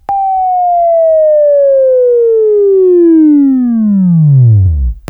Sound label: WAV Left, Sweeping